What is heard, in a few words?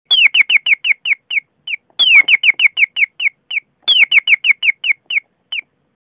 bolbol